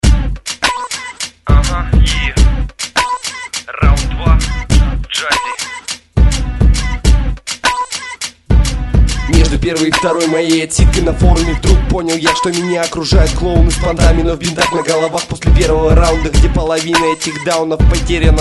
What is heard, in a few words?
jazzy